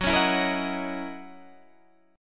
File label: F sharp 13th F sharp Guitar Standard; strummed; JGuitar